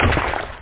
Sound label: Splat Pop; Amiga